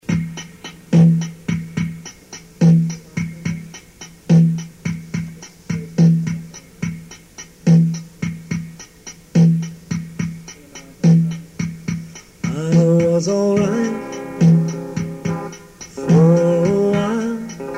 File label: crying